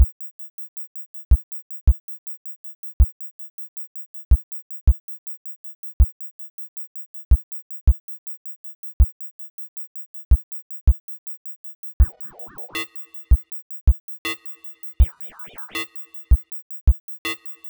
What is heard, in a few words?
Sinewave